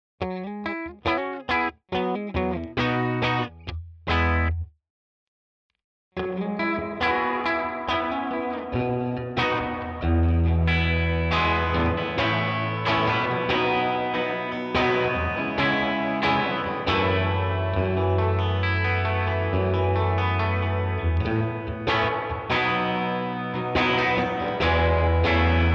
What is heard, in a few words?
Walrus Fundamental REVERB Spring long